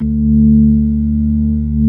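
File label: ORG B3 D 2, organ; Best Service Gigapack 2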